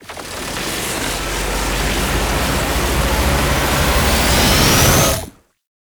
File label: Wrist Gun Alt Charge Up; Weapons; Warframe; Tenno